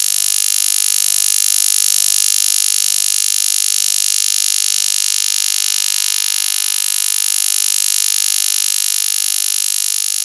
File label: RUS 50Hz 10kHz OTHR; Russian OTHR system recorded during an ongoing transmission; ALE-systems, tone calls, ionosondes & chirpsounders